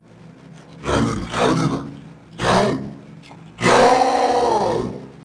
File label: sound test